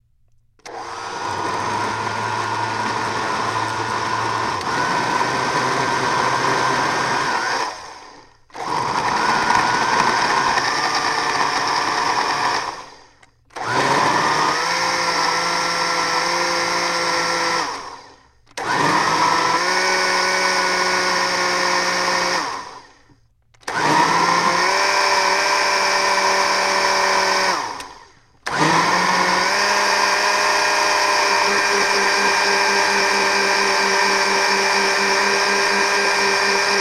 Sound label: Blender Full Jostle